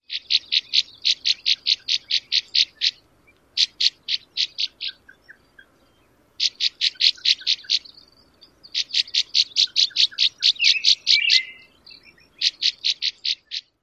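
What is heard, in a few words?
Northern Rough-winged Swallow, calls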